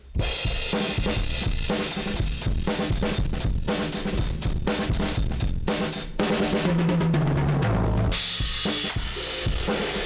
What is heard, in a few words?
drums Tube B